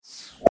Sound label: popup reverse; okokBanking